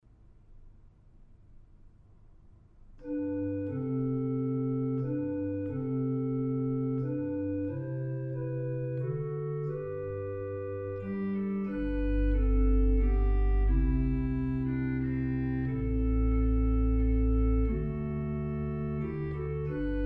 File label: Backing; festival choir